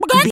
chicken cluck scream

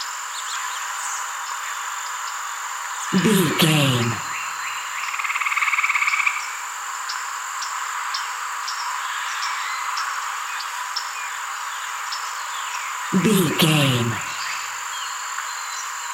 swamp bayou frogs birds daytime loop

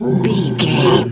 bear roar

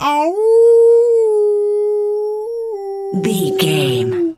wolf howl soft

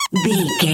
voice fun creature squirrel

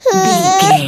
voice baby cry funny

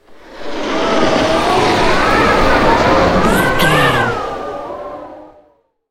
wind blizzard storm spell airy

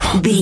voice male breath quick

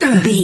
voice male hurt pain

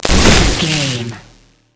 electric surge blast

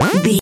retro jump bounce

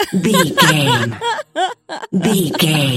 voice female laugh

